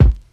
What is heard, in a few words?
subby kick drum G key